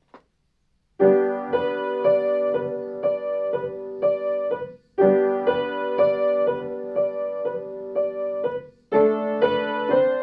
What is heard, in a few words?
piano solo